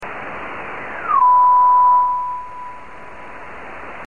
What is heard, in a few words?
ping